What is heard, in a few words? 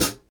hat